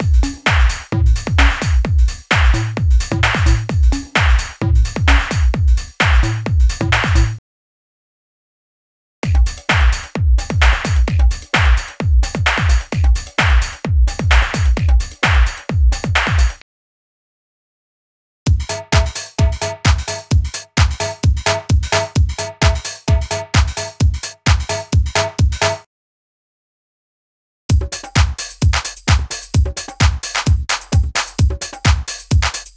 House Loops 130 BPM; Ударные (Drum Loops)

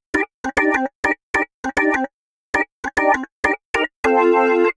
Dry Org; organ

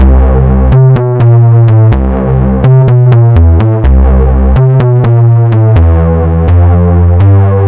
Gliding, big beat

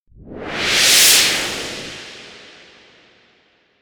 Noise B; 90’s Eurodance

